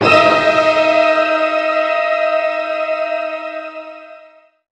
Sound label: Clinging To Hell Hit; Left 4 Dead; x360